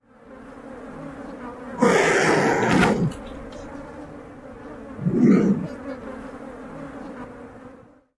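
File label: hissing grunts, Turkey Vulture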